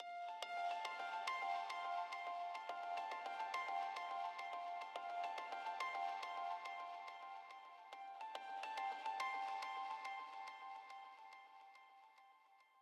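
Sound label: Tension Melody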